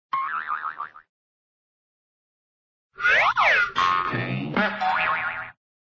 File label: boings, sproing, whistle and boings; cartoon; hannah barbera cartoon sound fx